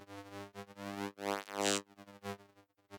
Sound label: accumulator working; factorio 2.0.55